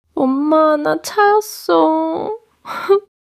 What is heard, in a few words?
crying